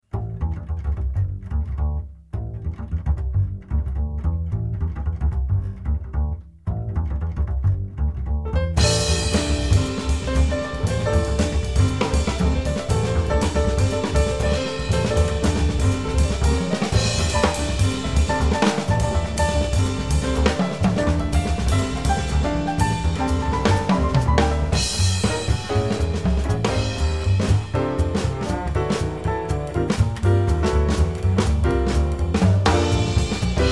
minus melody

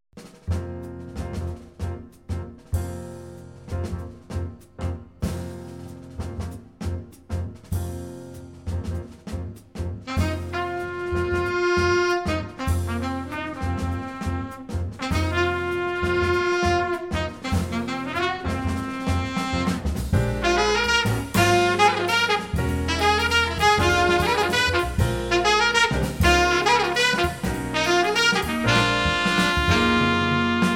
back room blues

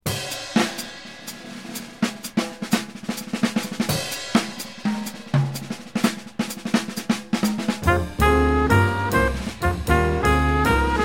medium up MIX

